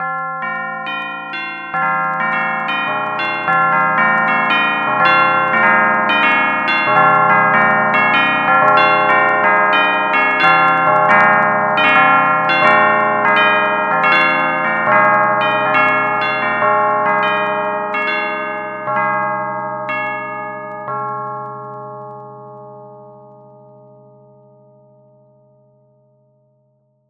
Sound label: peal, chimes